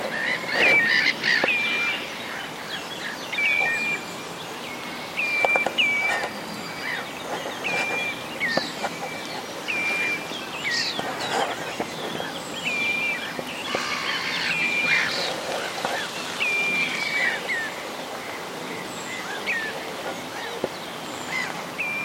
chevalier gambette

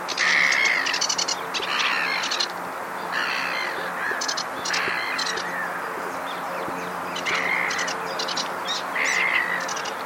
phragmite joncs etang

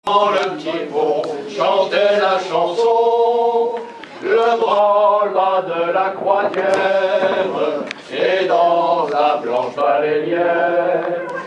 hommes chant marin

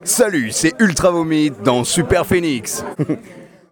jingle; le farfadet

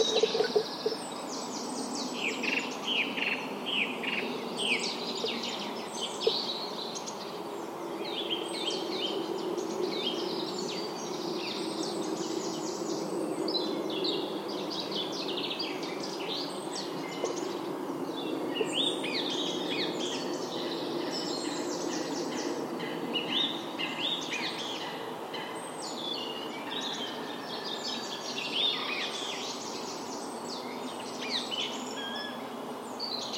pinson troglo musicienne friche ville

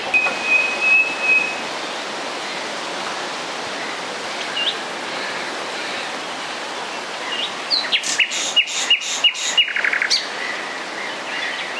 rossignol philomele

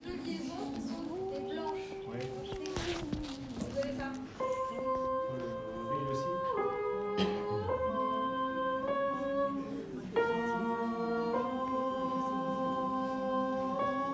tenor

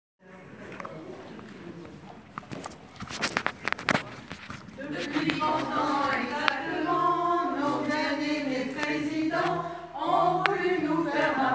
chorale

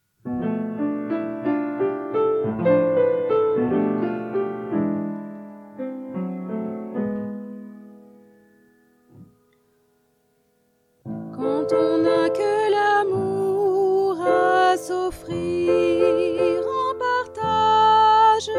tenor

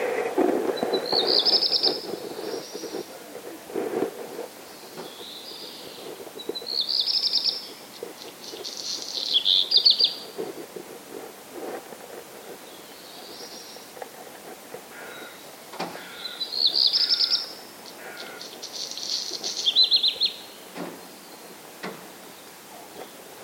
rougequeue noir; neuilly marne

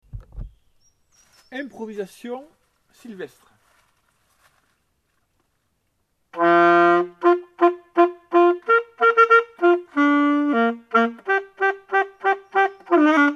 improvisation sylvestre

